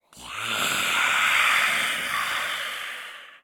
Breaths, Male, Exhale, Ghost, Evil, Hostile, Snarl